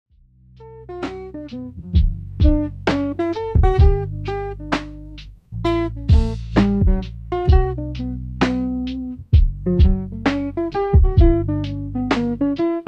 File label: Chill Lofi